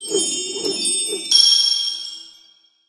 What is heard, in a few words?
click feather